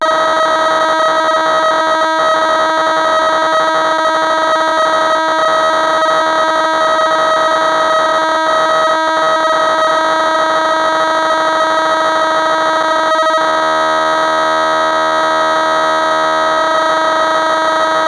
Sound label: Moog Source factory presets, Cassette Interface; Synthesizer Factory Patches